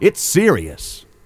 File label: VOX GENERAL; AMG Megabass REMIX! VOL-2